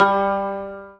ZIMBAL G 2

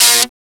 DIRT GUITAR; FAIRLIGHT CMI IIX; GUITARS

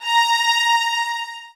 SOFT VIOLINS; Q Up Arts; Denny Jaeger Master Violin Library

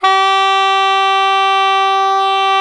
SAX G2, SAXOPHONE; USB Soundscan vol.63; Balkanish Traditions; AKAI

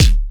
Kick, dry kicks; Quasimidi Rave-o-lution 309

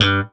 POP BASS G1; E-MU Formula 4000 Series Vol. 5; Protozoa; Proteus1 Instrmt

